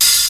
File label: studio open high hat d sharp key, Royality free open hat one shot tuned to the D# note